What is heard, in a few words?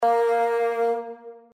vuvu